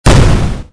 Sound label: explosion nade